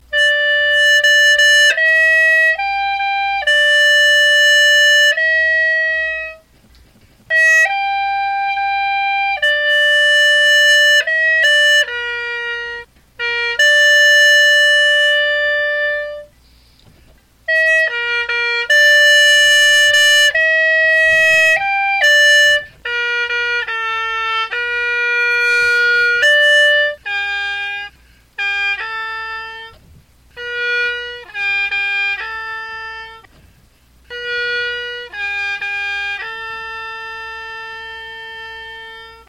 lullaby hulusi

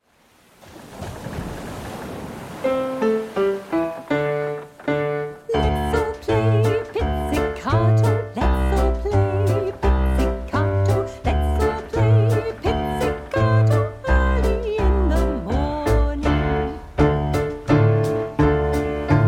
violins

